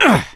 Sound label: melee swing; survivor; gambler